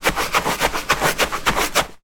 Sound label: footstep fast wiping on doormat; sound effects one